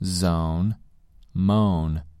zone moan

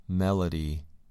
melody

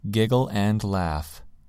giggle and laugh

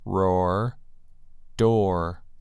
roar door

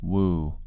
woo

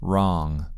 wrong

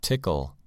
tickle

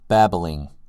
babbling

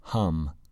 hum

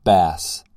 bass

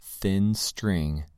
thin string

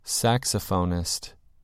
saxophonist

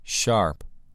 sharp

